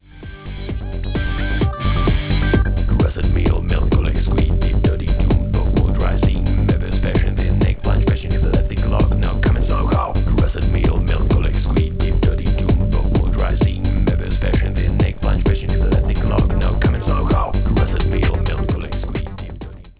alko rap 8bit

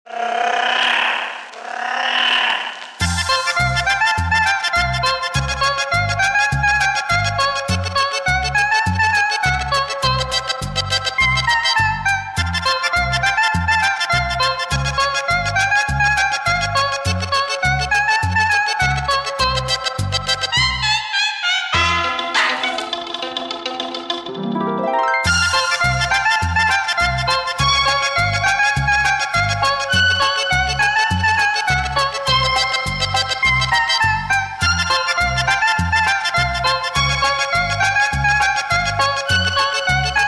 ska

